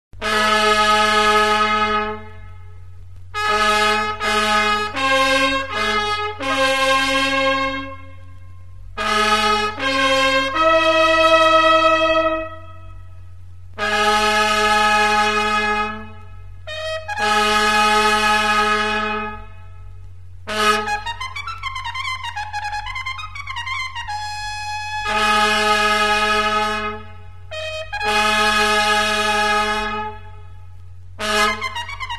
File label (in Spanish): al paso caballeria, marchas de caballeria; himnos de caballeria